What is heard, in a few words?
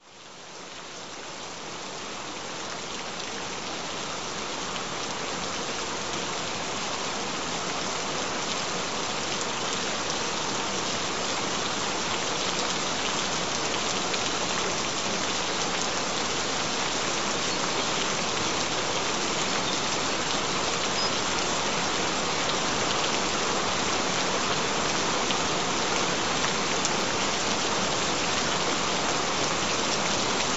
Rife Frequencies Gentle Rain Sounds; Osteoarthritis Healing